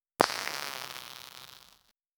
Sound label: ultra short one shot fart; humor